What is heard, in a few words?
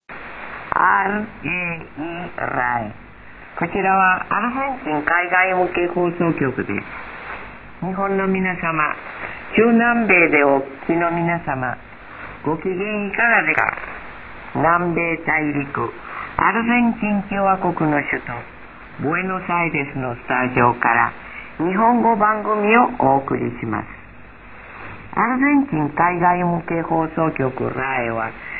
11710khz; RAE